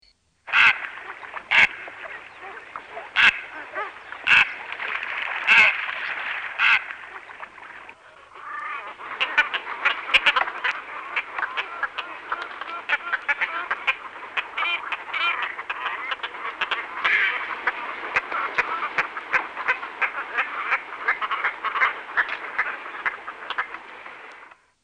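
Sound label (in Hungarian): Üstökösgém; Madarak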